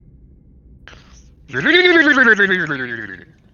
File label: frog; palli